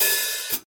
subtle reverb open hat sample a key, Royality free open hat sound tuned to the A note